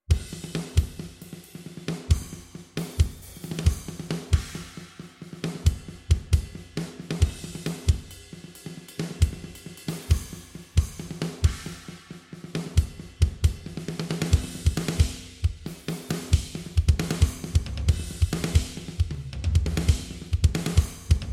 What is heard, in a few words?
drum only; the origin drum kit; polarity audio